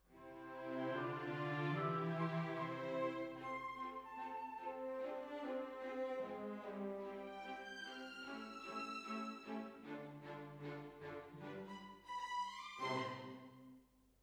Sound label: Hall